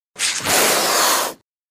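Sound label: cat hissing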